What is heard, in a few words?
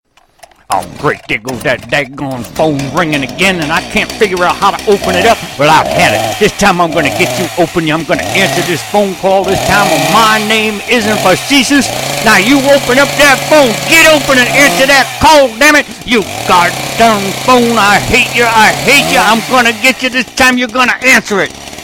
Chainsaw